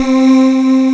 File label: OOHH; AKAI S6000 CD-ROM - Ultimate